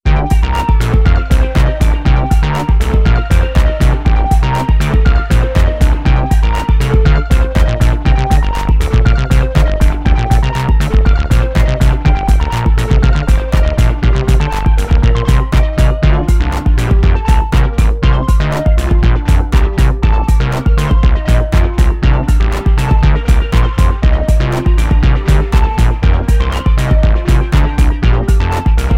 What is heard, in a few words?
music; Mission Python